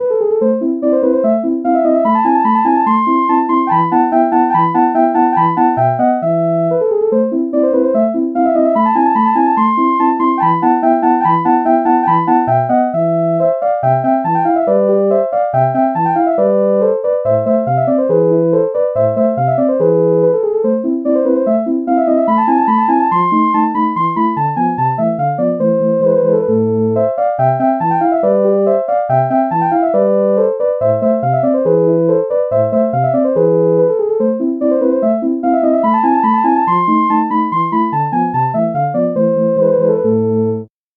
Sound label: tuba